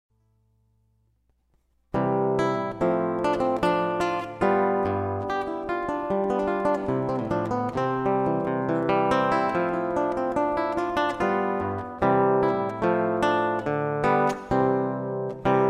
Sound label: Classical Guitar; Gavotte En Rondeau - Bach; Wedding